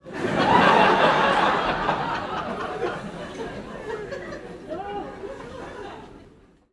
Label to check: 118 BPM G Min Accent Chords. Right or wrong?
Wrong